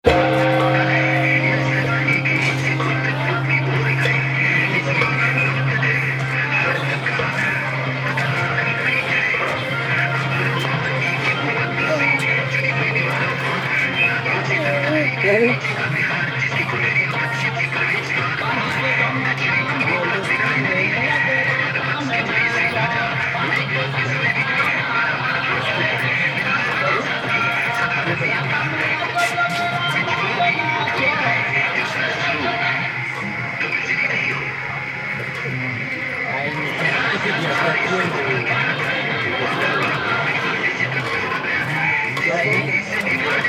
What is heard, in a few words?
nalanda tibetan bell